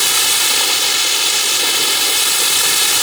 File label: orb smoke fog